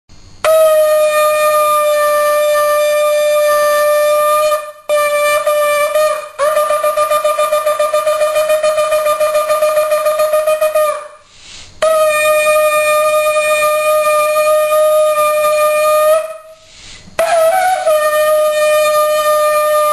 Du Shofar, sonnerie

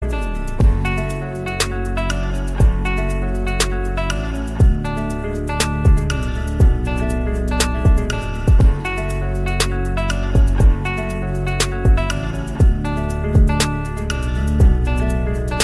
instru

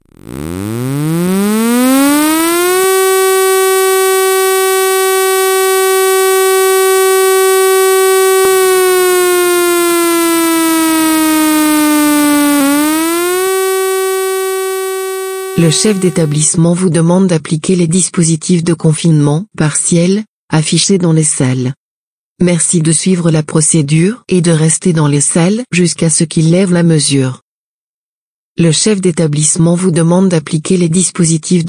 Alerte Confinement Partiel

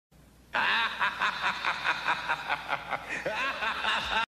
SMS pour Halloween

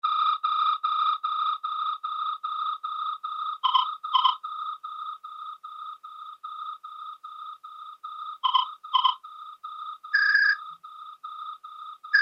Cigale

